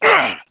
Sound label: pain; HereticII Demo